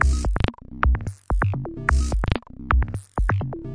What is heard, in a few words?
sine growl rolls, drum loops and breaks